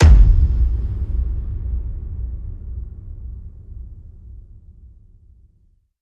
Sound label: Hit Low Surrender